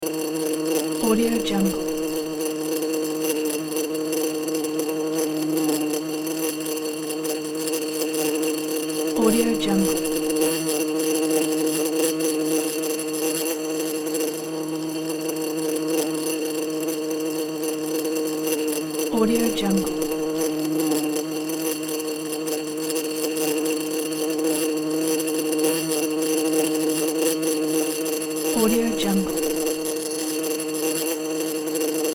wasp in a jar; marbury-media

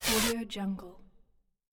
scifi interface swipe

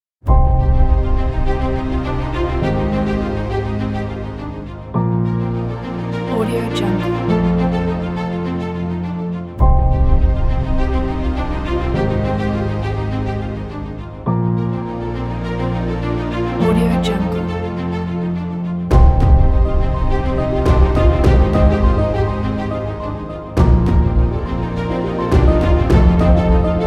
cello violins

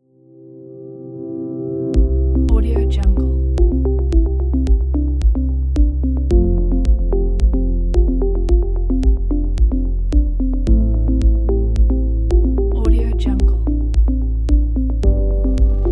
easy minimal; penguinmusic

